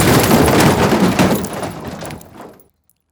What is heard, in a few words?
metal debris crash